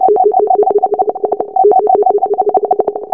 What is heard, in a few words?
rattle fx; Zero-G Ambient 1